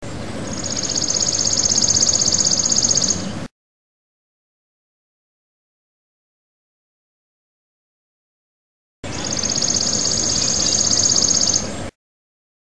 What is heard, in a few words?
chipping sparrow